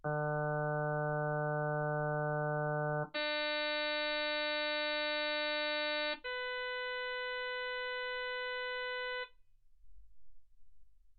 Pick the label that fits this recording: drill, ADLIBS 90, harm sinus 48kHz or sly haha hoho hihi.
harm sinus 48kHz